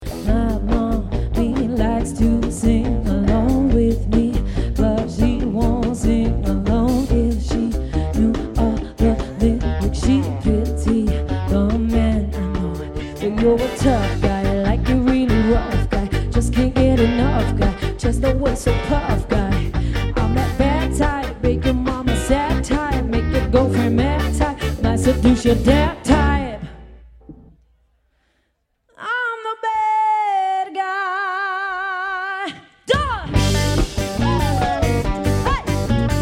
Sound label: swing, gypsy swing twist on popular songs